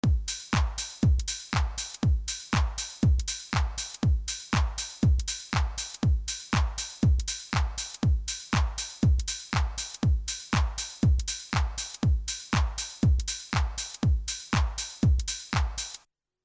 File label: Dance